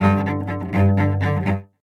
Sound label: Cello Phrases C Major (120 bpm); Instruments; Distressed Strings; Ableton; Mood Reel